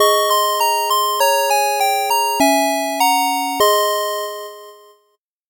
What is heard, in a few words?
8bit jingle fast